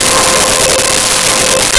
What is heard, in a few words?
Viola C5; Nintendo; July 2020 Gigaleak